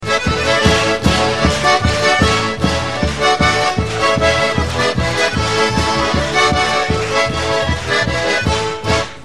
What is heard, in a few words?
polka; lietuva; ringtones lituanos